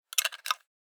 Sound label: Metallic Gear; Survival Game Sounds - Lite Edition